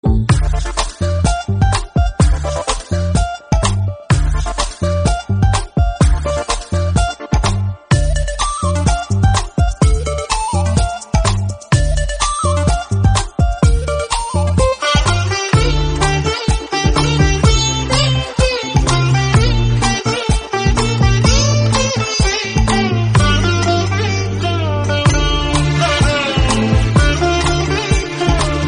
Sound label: melodious banjo music call notification tone